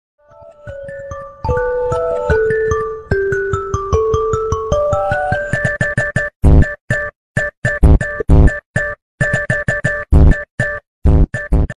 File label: brazilian alarm